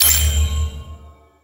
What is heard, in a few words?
bigwin; DraculasCastleWD; wazdan